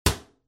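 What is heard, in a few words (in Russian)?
sound of a magnet peeling off a door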